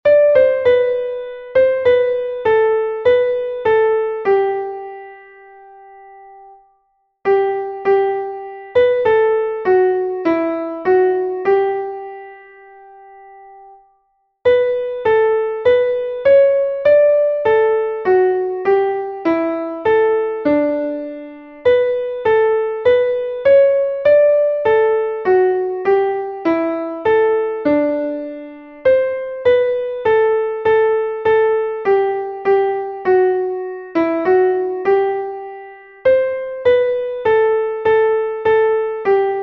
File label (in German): auf dem berge da geht der wind, klavier